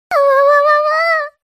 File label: awawawawaaaaa